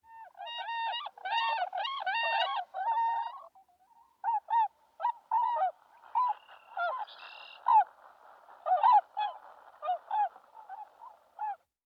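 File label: swans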